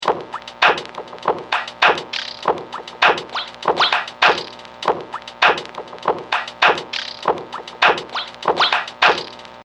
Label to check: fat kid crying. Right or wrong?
Wrong